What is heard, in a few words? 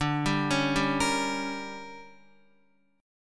D Major 7th Suspended 4th Sharp 5th, Guitar; DM7sus4#5; arpeggiated; JGuitar